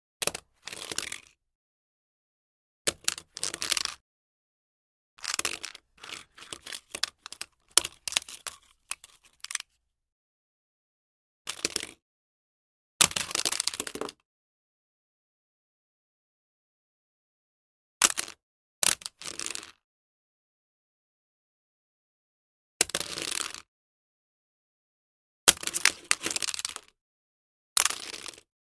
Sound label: 22 Ruger Revolver, foley gun movement